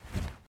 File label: Cloth Walk